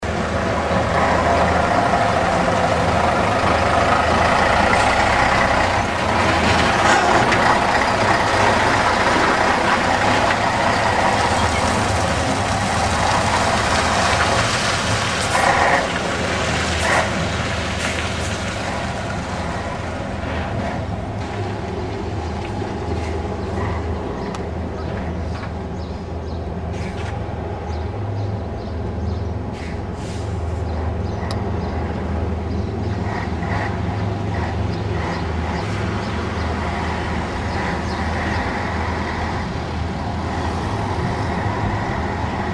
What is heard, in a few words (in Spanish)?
sonido pegaso troner 370cv